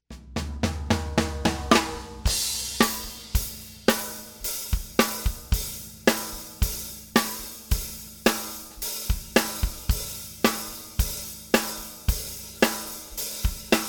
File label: alesis compressor 3632 bypass